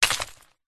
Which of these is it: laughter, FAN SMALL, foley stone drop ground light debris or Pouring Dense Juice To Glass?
foley stone drop ground light debris